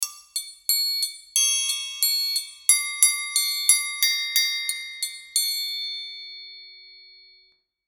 Musical Triangle, Musical Triangle Rhythm; Triangle Sound Effects; Blastwave

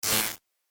Laser Static; Laser Sound; Multimedia

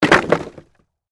Impact Wood Palette, Drop, On, Palette; Materials; BlastWave

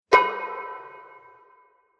Treated Metal Hit; Metal Sound Effects; Impact

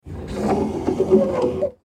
Door Closet Track Close Slow; Closet Doors; Foley; Closet Door Sound Effects

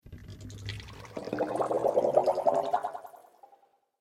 Water Space Bubbles, sci fi; Water Sound Effect; Splish, Splash, Splosh!; Andrew Fleming

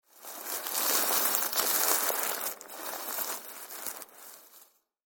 Warehouse Searching Through Garbage; Garbage Sound Effects; Foley Sound Effect; Andrew Fleming

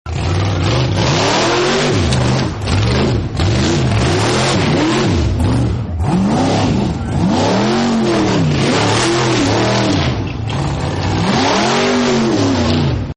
Hot Rod Does Donuts, car; Hot Rod 2 Does Donuts; Cars; Sound Effects:Vehicles; Partners In Rhyme

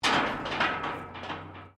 metal rusty move; Materials

